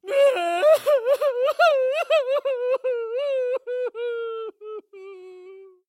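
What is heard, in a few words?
sounds of male crying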